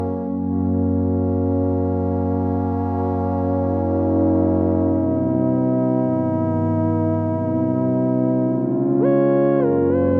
Overtone Scale Song; 24 NEJI128

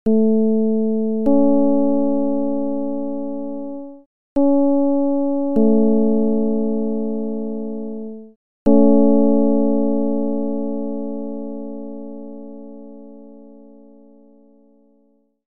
csound foscil 220hz

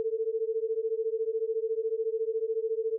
Autogenerated sine 120 cents

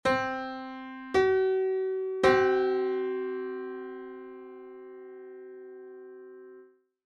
Piano 5edo

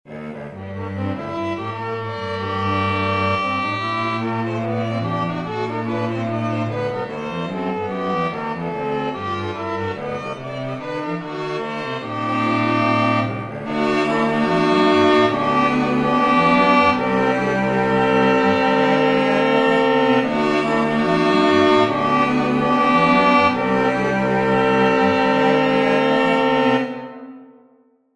14edo Sketch

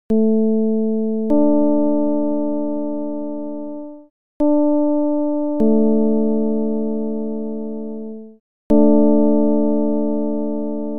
Ji 43 32 csound foscil 220hz

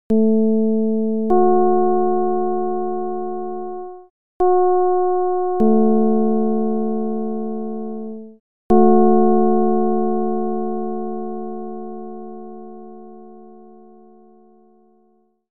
csound foscil 220hz

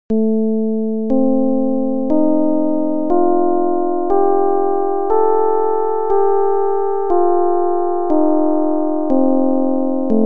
csound foscil 220hz

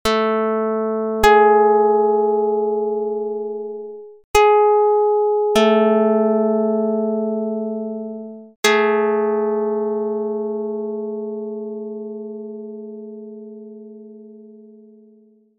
pluck; dr220

